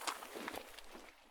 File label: prone gravel; Escape from Tarkov